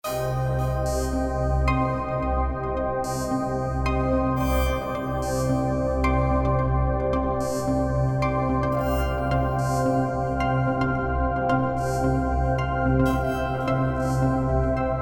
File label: Synth